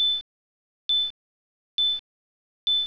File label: ping; cstrike; tf2